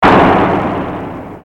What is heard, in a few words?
explo